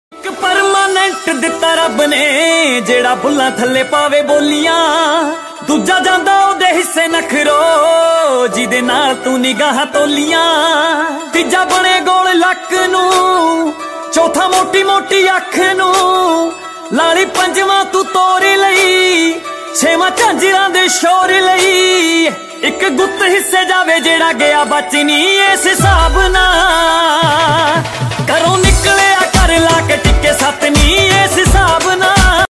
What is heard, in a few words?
Punjabi Ringtone